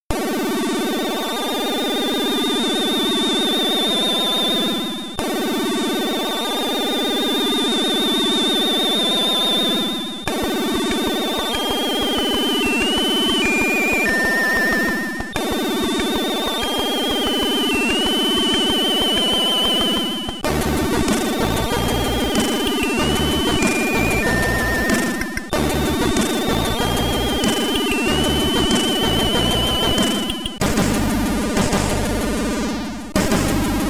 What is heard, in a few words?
SID Stereo; brain artifice; commodore 64